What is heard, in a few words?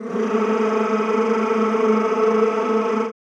CHO Rrrr; Sound & Vision; Gigapack I CD 2; CHO Mmhh + Rrrr